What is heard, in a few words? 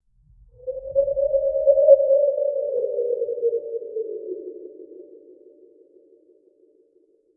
Alien jungle